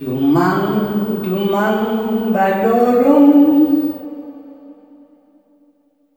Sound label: LULLABYE, SOLO FEM; Spectrasonics; Heart of Africa 2